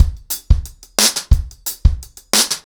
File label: 90BPM, acoustic drums, drumloops